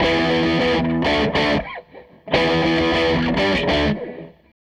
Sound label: Strat Guitar Loop